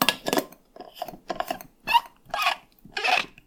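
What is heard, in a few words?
close thermos bottle; cooking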